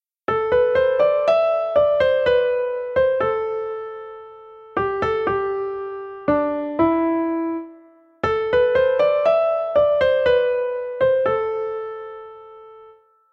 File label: Piano Melody